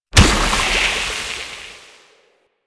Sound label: Undertow Corrosive; Warframe; Warframes; Pirate